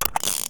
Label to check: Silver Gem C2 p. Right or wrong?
Wrong